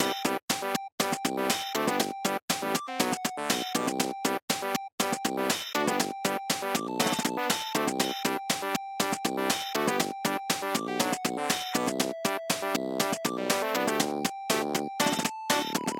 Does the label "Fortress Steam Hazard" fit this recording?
No